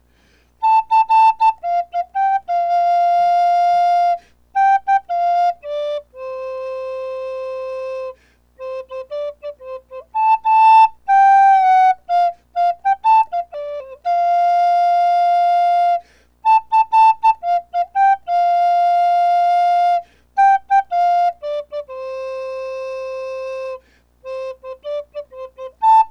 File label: flute